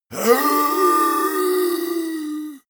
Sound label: monster growl hoarse; comedy-cartoon; soundpool